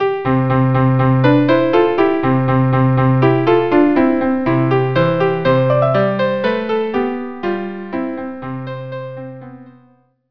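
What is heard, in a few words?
marche viola, viola; bach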